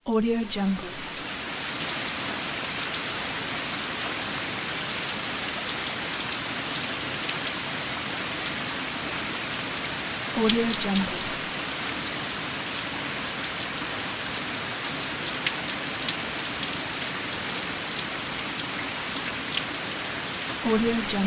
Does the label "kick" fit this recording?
No